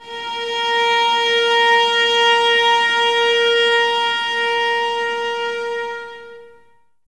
STR VAS A#, String Ensembles, Violas, No Vib; Miroslav Vitous

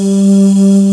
VOX, CRYSTAL SYN; AKAI S6000 CD-ROM - Volume 1; SYNTHESIZER

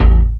techno, BASS; Techno Trance Essentials

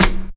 Clap, Percussion Hits; Zero-G Jungle Warfare 1, 2 & 3; Drum Loop Hits; Cha Hits

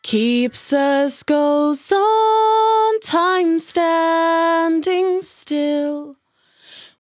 100bpm Bm, Velvet Vocal; Zero-G

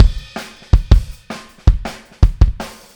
Kick, 162 BPM; Drums; Drums from the Big Room The Mixes; Take Your Toms Off; Verse

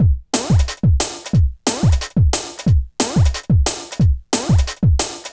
Good Beat, Drum & Bass, Beats & Breaks; Fluid Dynamics

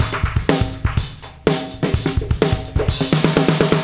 Beat Loops; Zero-G; Monster Beats

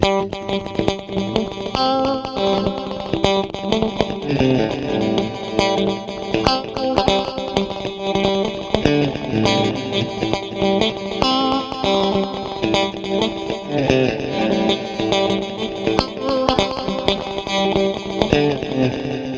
PINGPONG, Funk Guitar; 90 s Sample CDs; Zero G

